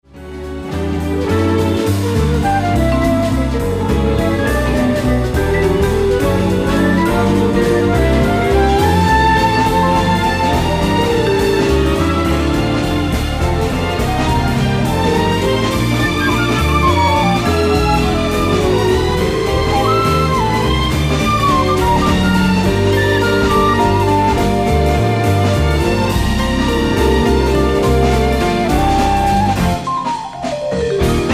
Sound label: Orchestration Cartoon Jazz